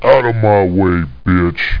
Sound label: hieya; Duke Screw'em 38D